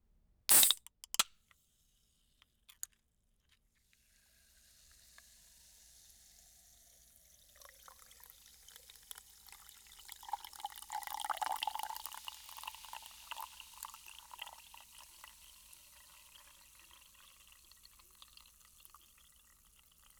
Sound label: Soda Can